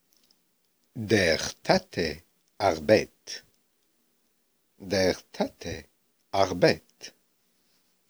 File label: Vocal